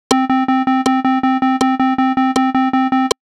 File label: Rhythm Note